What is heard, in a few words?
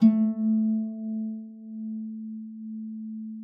HARP PIANO G#3; Xsample VOL-8